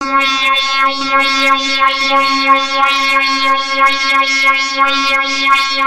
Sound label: vuvazelas; pacificpelican us podcast